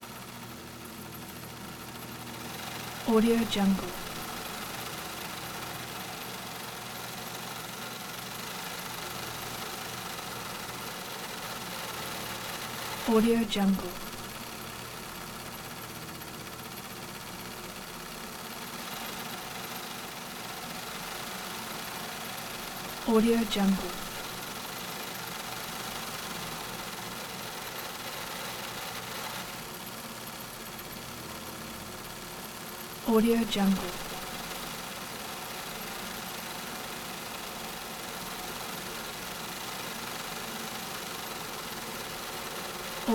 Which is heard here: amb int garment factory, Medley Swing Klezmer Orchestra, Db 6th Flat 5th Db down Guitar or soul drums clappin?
amb int garment factory